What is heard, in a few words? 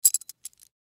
Butterfly Knife Handle Movement; Warfare; Knives; Combat